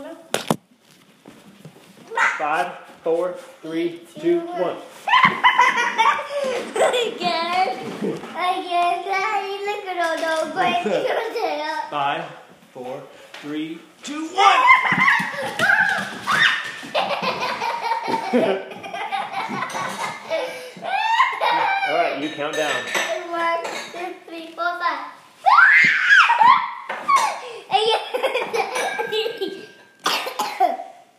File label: Floor Blast Offs